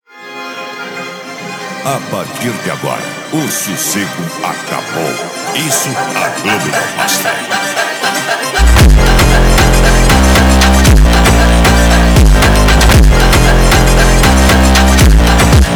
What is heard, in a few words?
epic funk